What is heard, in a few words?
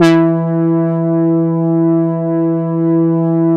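E3 ANA PAD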